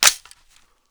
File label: m16a2 bolt catch, weapons; garrysmod